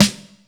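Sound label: snare drum, Casio CTK-5000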